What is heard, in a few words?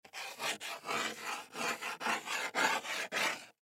Pencil Write Long Hard; Writing; Office; Human; Blastwave